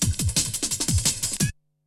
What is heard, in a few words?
BREAKBEATS, TECHNO